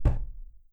hit

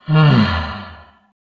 monster; creature

